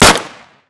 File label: Fire; Weapon Package FortWars